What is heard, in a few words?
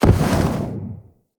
Enter Aim Glide, Foley; Tenno